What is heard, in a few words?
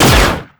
gun